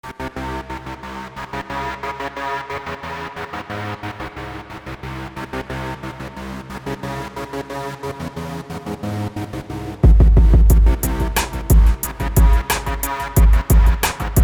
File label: Gangsta Hip-Hop